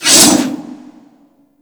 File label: Great Sword Swipe; Warframe; Weapons